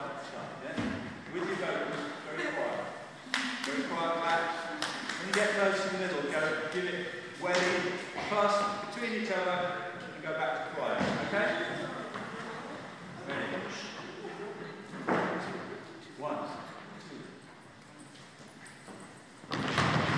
stomp dance

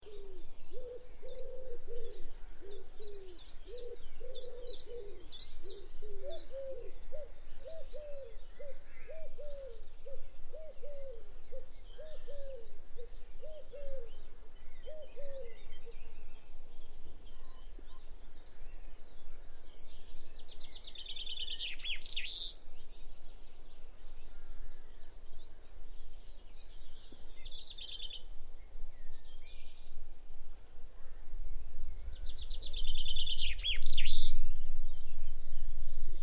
birdsong; at ringstead

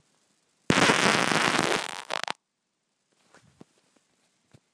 nice fart this one

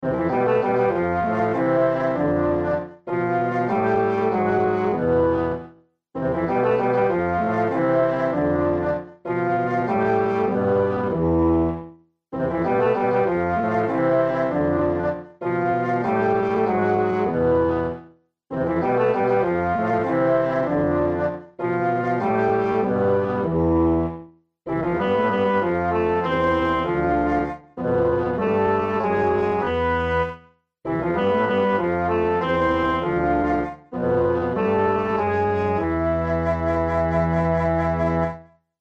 fanfare for the television organ

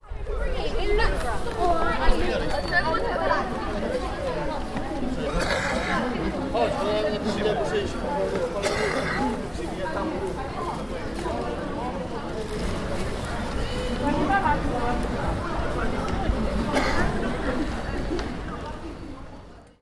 coughing; outside waterstones

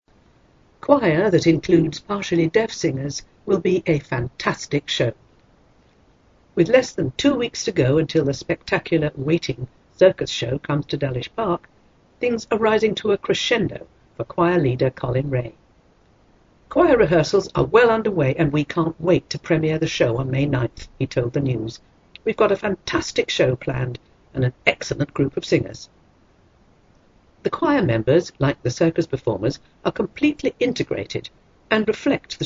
choir includes partially deaf singers

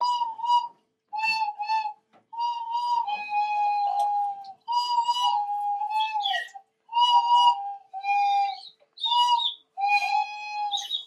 flutes symphony

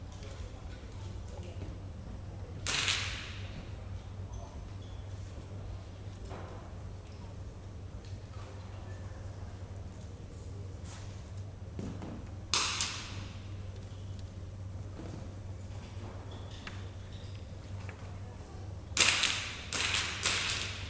snap snap snap

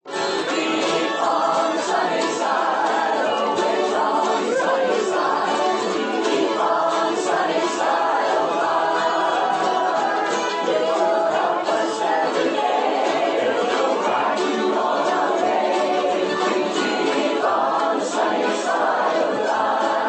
gospel singin; sunday; ncmf 2012

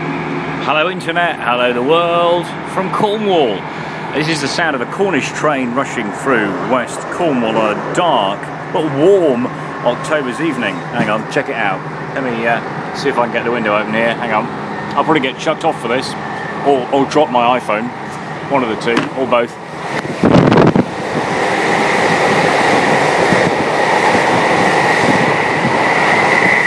cornish train

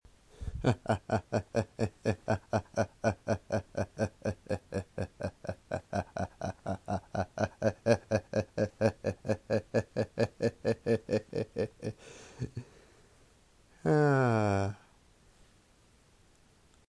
laughter at night

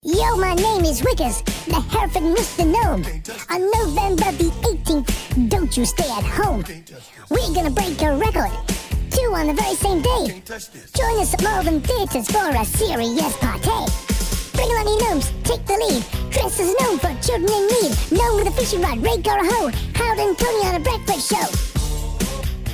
gnome rap

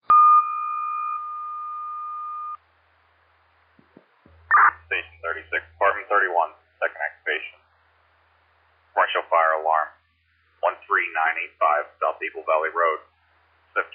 page

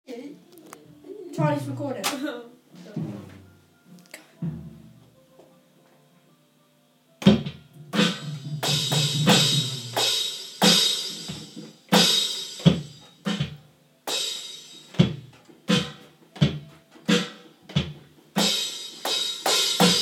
live drum recording

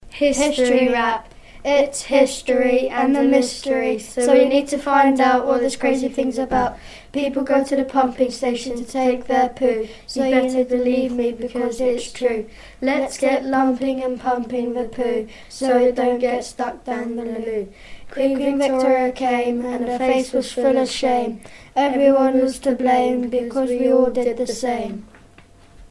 history rap

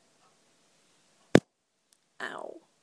ouch

